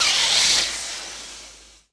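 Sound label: sith lightsaber ignite; weapons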